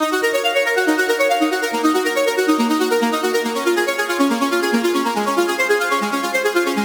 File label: Arp D# 140 Pulse, Melodic Loops; Ghosthack - Color Bass Essentials